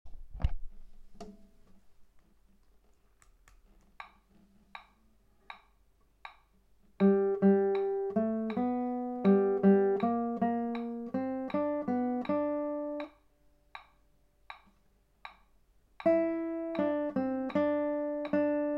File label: GUITAR Chorus in G; chorus in G to coda